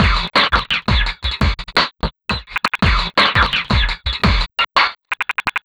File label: Busy, Beats; Sonic Foundry (Sony Creative Software); Synchro Funk